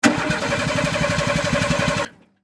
Car Fail To Start

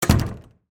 Door Close

